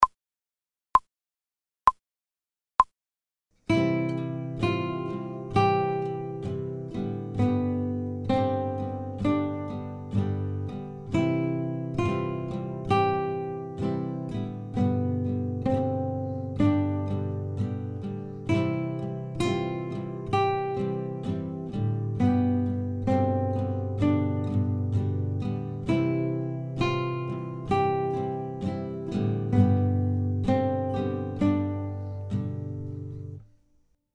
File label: slow, guitar; without metronome; Upon Reflection